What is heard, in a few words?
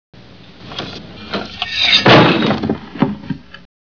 guillotine